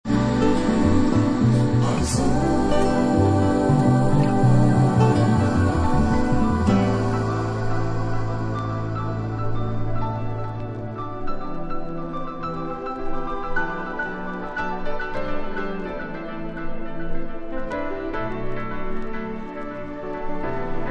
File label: cajon rhythm